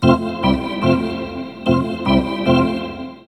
Organ

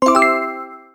Magic Harvest; the princess and the frog; Swamp; Magic Add

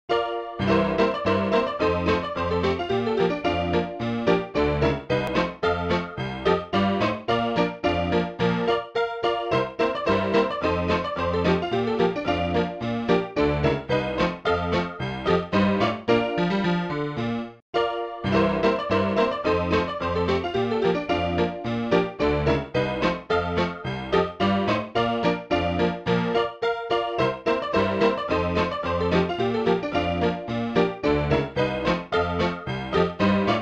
Saloon Rag Piano; The Wild West; roblox

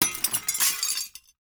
glass break

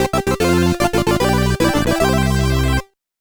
Level Up; Obsidian Prince

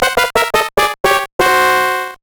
Jingle; It's Mr. Pants; GBA

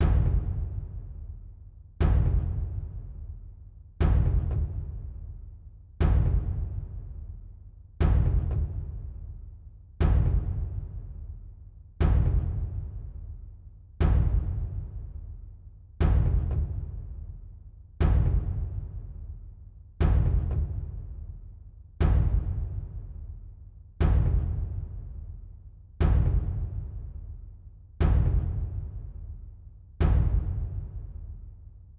Stabs; raji an ancient epic

